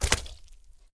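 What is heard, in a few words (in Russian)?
в теплом море плещется рыба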